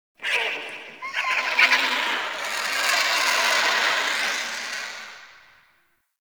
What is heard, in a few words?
Dungeon Island Vultures, Ambience